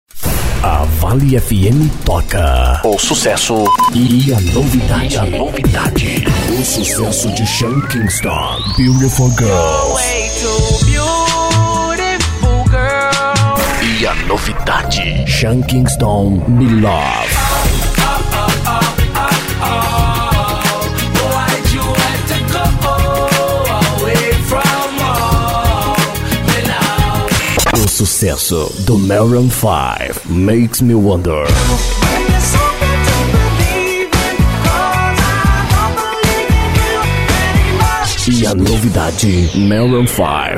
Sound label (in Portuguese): Vinhetão Mix Sucessos; Vale FM